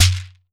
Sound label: shekere